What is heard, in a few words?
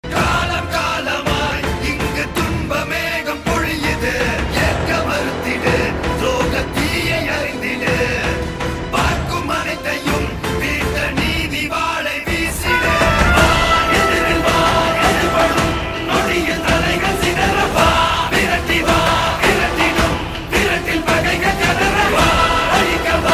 blood bath ringtone; tamil; asuran